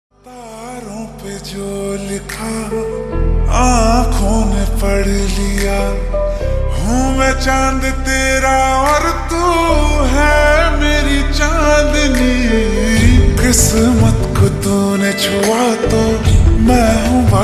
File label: Slowed Reverb Ringtone